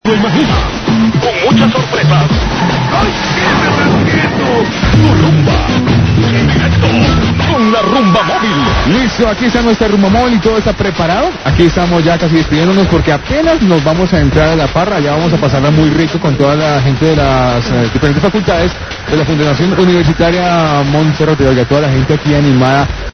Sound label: Rumba